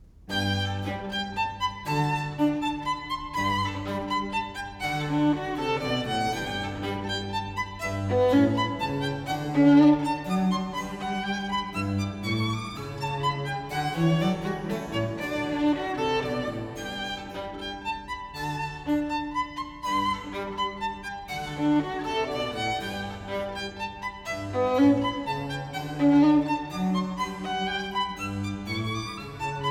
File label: Sonata in G major; Vivaldi